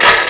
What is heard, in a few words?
GEAR CRN